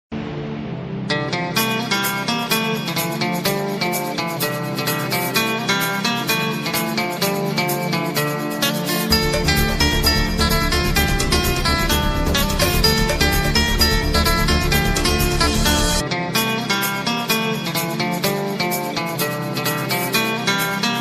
instrument bgm ringtones, love bgm ringtone

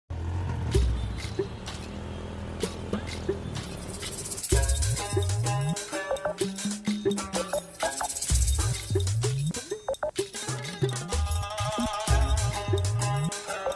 comedy theme bgm; raja raja chora

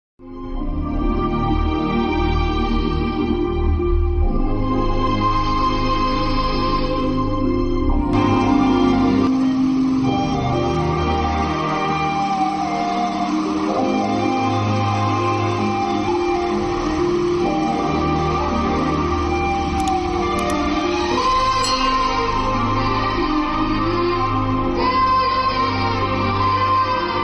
heroine entry bgm ringtone

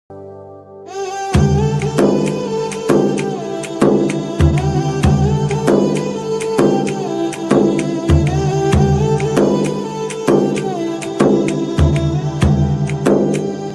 bgm song; Kotha Bangaru Lokam

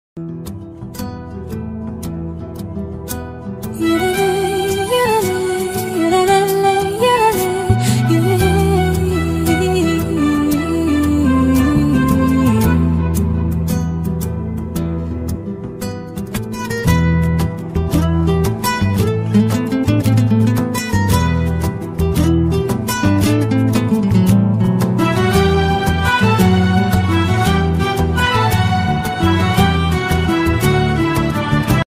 background music ringtone; manam movie

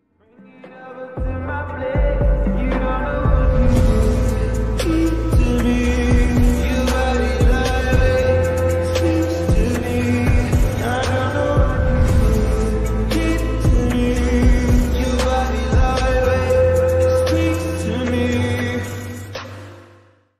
slowed and reverb ringtone english tone

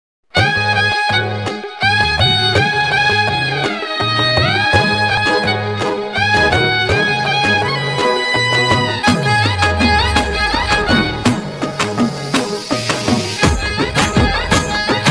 folk song ringtone telugu folk song

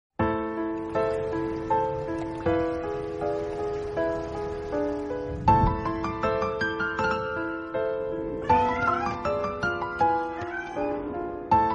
piano bgm ringtone; maestro; nithin reddy; tamannaah batia

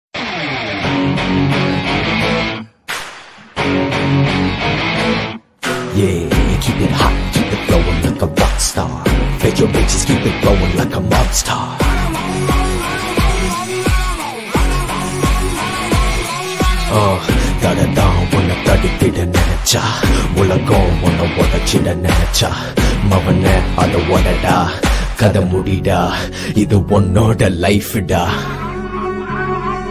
aditya varma bgm ringtone; bgmknight

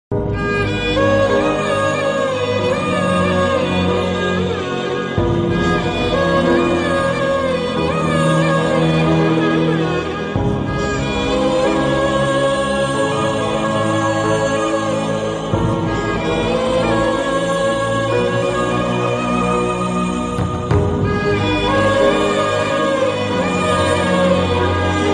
emotional love bgm ringtone; sardar gabbar singh; pawan kalyan

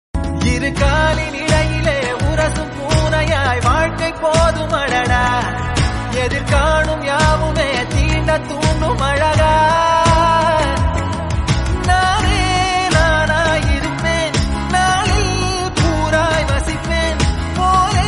tamil movie cut song bgm mobile ringtone

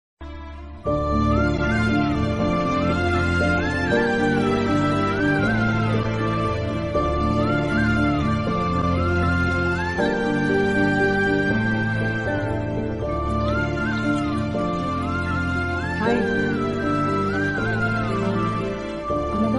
Sad Bgm; pandu & shobana; Thiru

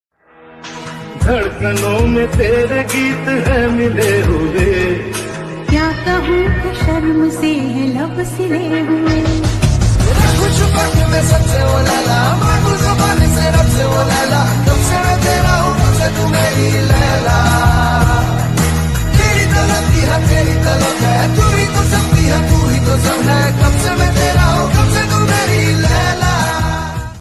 remix ringtone, love remix ringtones

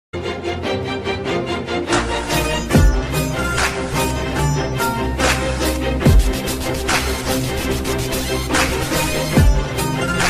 kaaki sattai bgm ringtone villain beats

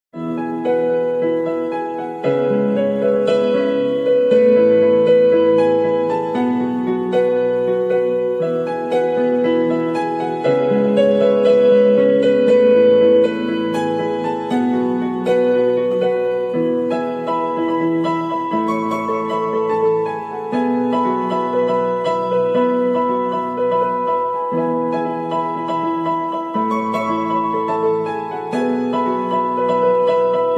ringtone bgm tamil bgm ringtone tamil song; am creation

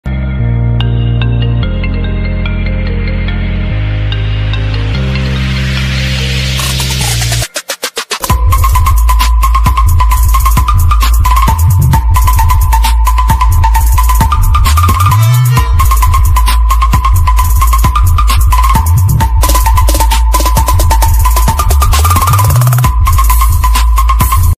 iphone x bgm ringtone; jay bhim

